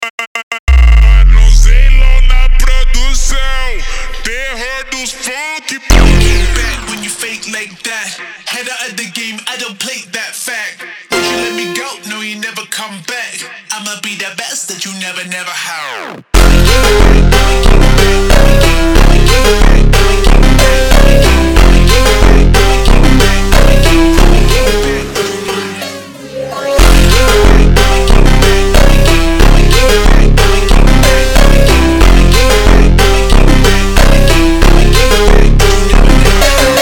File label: Phonk MONTAGEM Super Slowed